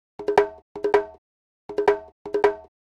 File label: Conga, Latin Percussion; Sonic Foundry (Sony Creative Software); Joe Vitale Latin Percussion